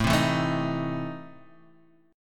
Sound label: E Major 7th Suspended 4th Sharp 5th, A